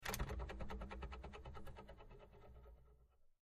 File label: Antenna Oscillating